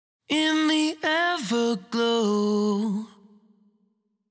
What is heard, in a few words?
Vocal Phrase "In The Everglow", 128, Bbmin, Wet